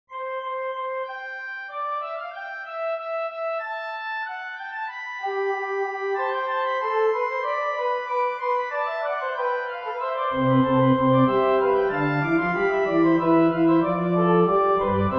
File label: Fugue in C major